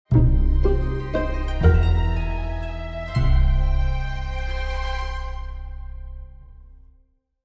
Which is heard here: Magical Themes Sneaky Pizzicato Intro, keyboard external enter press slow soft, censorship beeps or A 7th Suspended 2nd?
Magical Themes Sneaky Pizzicato Intro